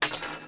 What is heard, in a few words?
drop, tavern; AMBIENT; Pirates Of The Caribbean